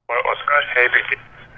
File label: onboard fm pipeline denoised; ground demodulation ladder; ESA